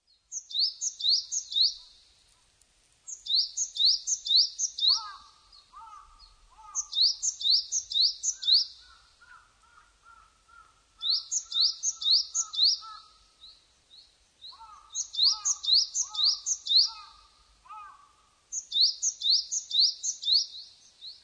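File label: Japanese tit; sijyuukara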